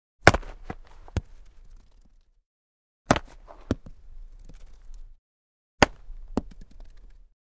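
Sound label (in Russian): бейсбол попадание мяча в перчатку кидают мяч на пол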